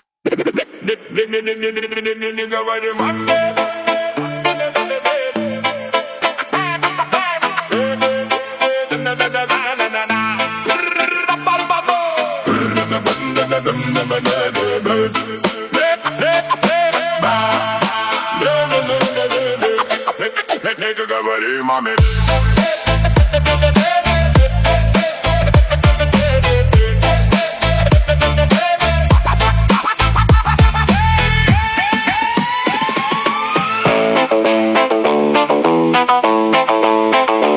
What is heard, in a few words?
Night Bass Atmos